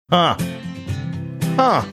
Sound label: "huh huh"